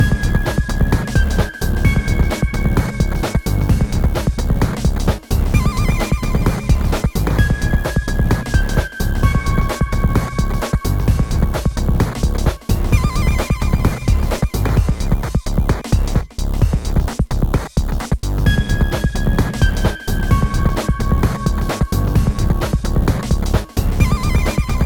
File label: loop